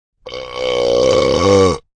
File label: Big Burp, Very big burp.; Burping; Human